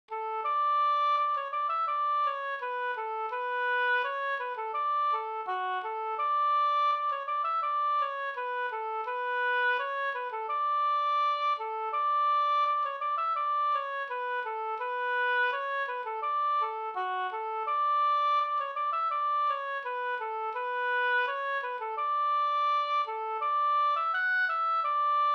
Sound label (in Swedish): brudmarsch Ridmarsch